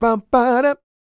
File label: SING, Vocal Planet R&B & Dance; ILIO